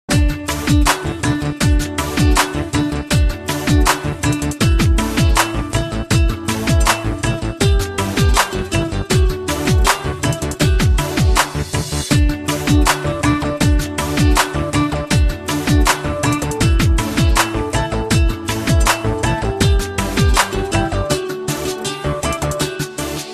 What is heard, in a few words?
Guitar tone